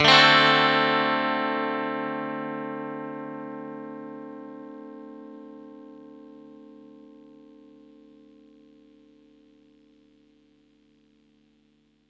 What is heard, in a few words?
clean chord D, clean electric guitar chords